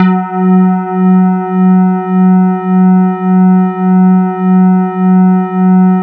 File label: E-HARP, DX HARP; Wizoo - Powered DX